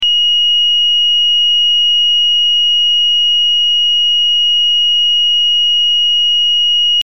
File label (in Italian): LINEARE 2850HZ